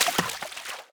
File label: water; firefight